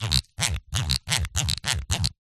industrial ratchet plastic turns clicks fast

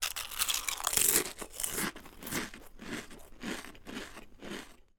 human eating potato chips crisps

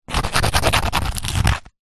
food rice crackers x2 scrape together

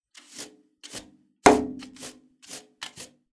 household brush hand metal radiator width; zapsplat

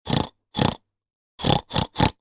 human pig grunt

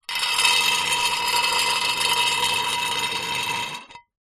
glass sheet small square scrape concrete; foley; zapsplat

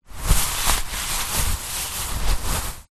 snow deep movement; foley

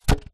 polystyrene piece drop ground; foley; zapsplat

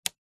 household socket switch mains flick click; zapsplat

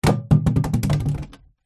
container water plastic empty drop ground; foley

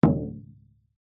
instrument drum large gamelan hit single

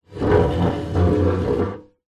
stool chair metal scrape drag wood deck; household

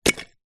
wood pieces handful small light thin set down; foley; zapsplat

